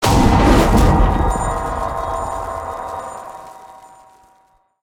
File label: arcane activate; smite; god merlin